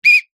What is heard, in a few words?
whistle blow light